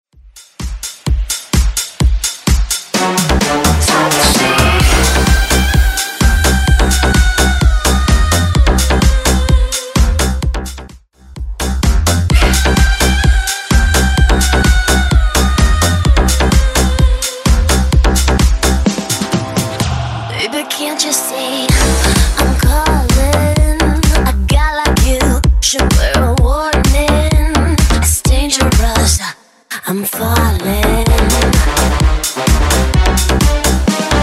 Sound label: Bootleg House Edit